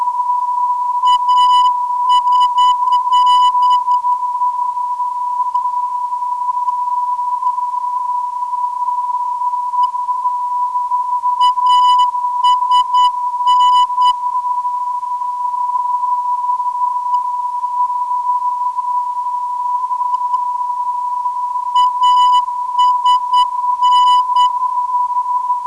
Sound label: 360kHz, ndb